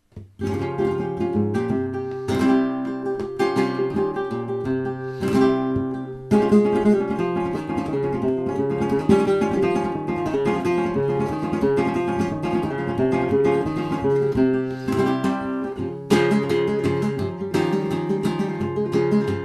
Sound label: tango, flamenco